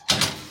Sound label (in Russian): bezopasnoe zakritie